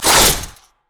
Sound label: crit attack; khazix